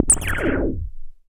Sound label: bloop; One Shot FX; Sample Magic; Transmission-X